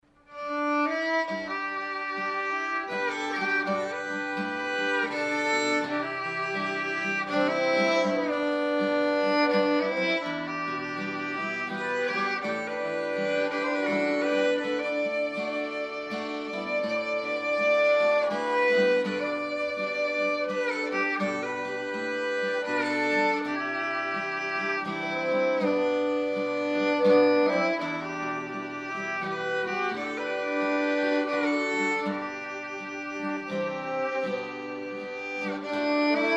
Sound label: instrumental amazing grace; m-millers